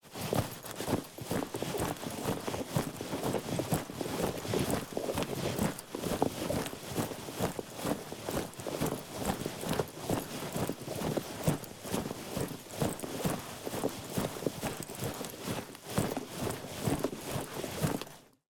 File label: Backpack, movement, walk